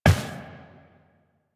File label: Step Glass, Footsteps Walk; DE; Warframe; Tenno